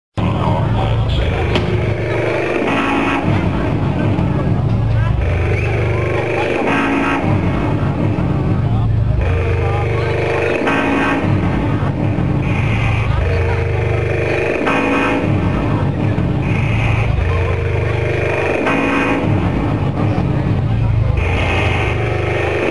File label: Experimental Industrial Noise; electronic experimental